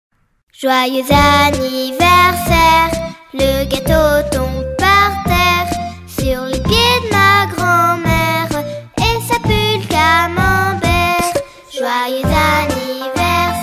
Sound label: Happy Birthday Song French